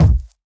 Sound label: bass drum single hit A key, kick one shot tuned to the A note; kick drum samples